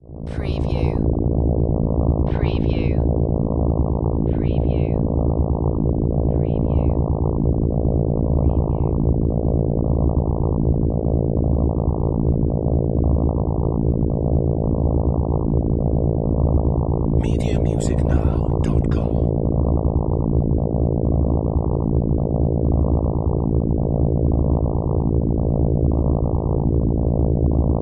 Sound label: Electrical Power; Sound Effects Bible